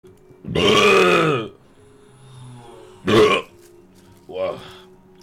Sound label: brrrrrp